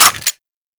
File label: Grineer Lever Action Rifle Mech; Weapons